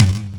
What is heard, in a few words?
tom, drums; virtual drum sounds; custom drums